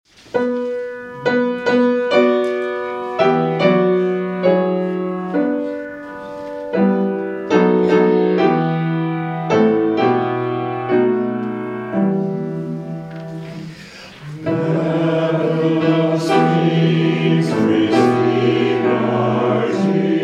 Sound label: Hymn; Babylon Streams Received Our Tears